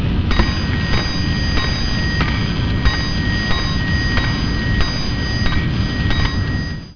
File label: clock